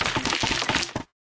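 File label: Dark Army Melting Furnace Carbon roll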